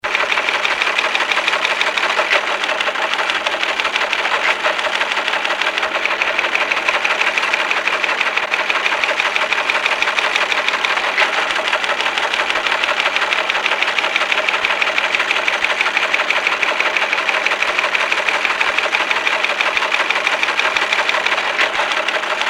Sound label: KYW Teletype; The Nugget